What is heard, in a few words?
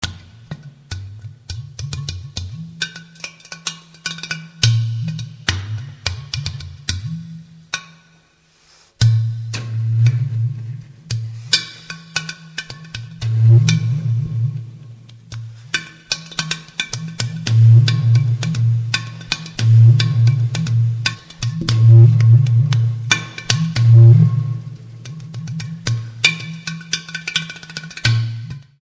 Udu